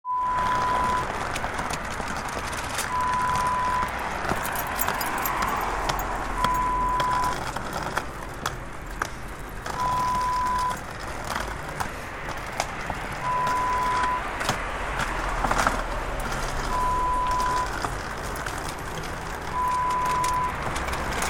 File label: Pulling Luggage sound